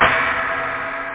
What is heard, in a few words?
hihat